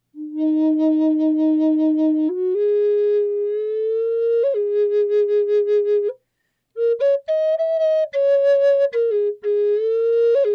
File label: Bass Eb Eastern Cedar, flutes